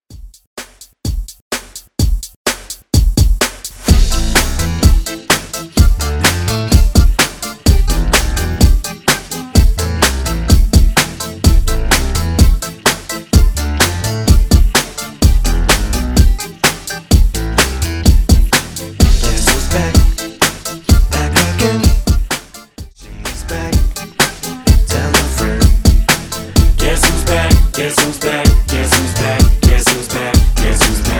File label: Hiphop; Redrum